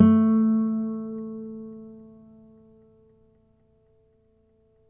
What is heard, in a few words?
NYL A, FLAMENCO NYL; Spectrasonics; Hans Zimmer Guitars VOL-2